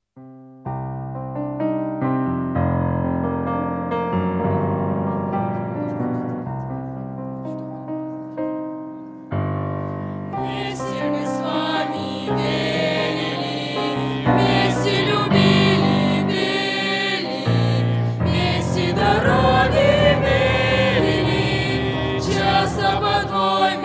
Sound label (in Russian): пение; Вместе мы с ввами верили; 2016 Апшеронск, Подростковый лагерь